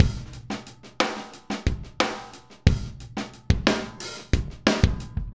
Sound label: Funk drums